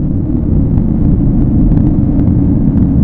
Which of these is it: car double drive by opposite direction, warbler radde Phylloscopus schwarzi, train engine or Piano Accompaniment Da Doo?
train engine